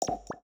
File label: match